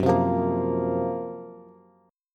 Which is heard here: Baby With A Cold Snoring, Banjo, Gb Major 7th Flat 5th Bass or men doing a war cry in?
Gb Major 7th Flat 5th Bass